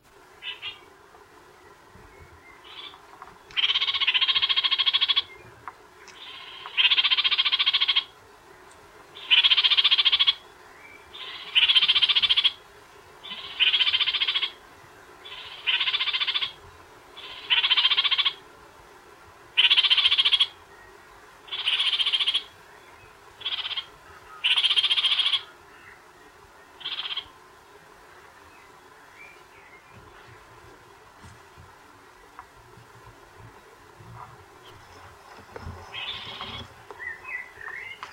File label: kakariki; Kākāriki Call; Whirinaki Rainforest